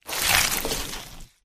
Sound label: human form long move; flood